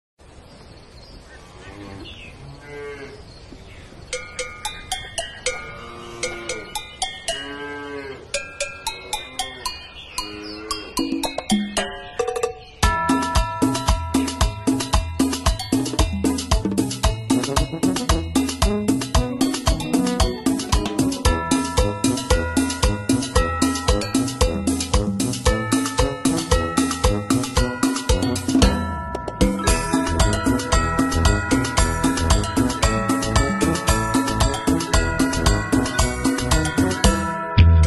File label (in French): cloche vache